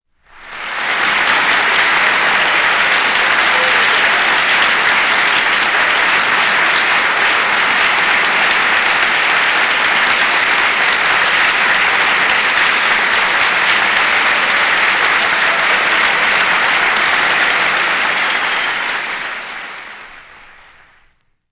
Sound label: Applause Large Crowd